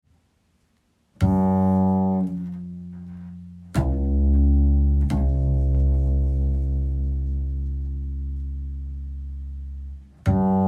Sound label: Double Bass Upright Bass Dark Ambient